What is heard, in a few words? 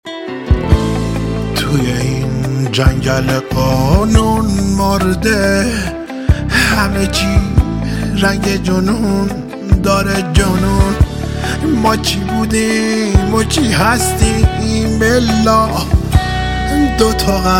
Ringtone